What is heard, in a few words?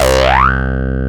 FILTR SWP, Synthy Tonal; Distored Reality